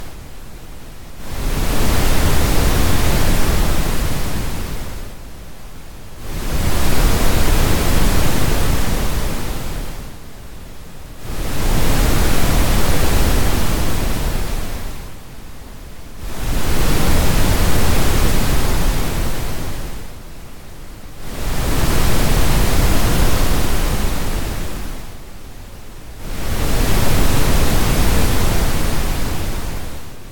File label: waves hitting shore